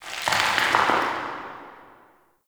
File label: Under City Alley Distant Rock Crumbles